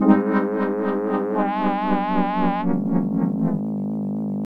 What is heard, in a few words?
Lose (Game Over)